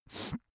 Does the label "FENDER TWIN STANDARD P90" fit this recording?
No